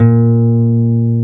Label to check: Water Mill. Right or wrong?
Wrong